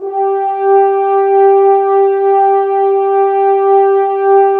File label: Brass F.Hrns; Miroslav Vitous - Woodwind Brass Ensemble